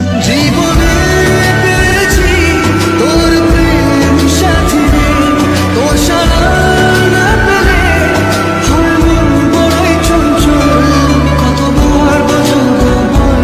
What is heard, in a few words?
Bengali Love Song Ringtone